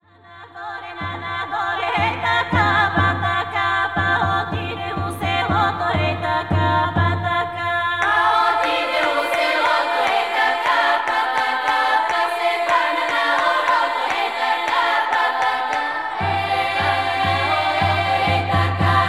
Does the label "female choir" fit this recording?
Yes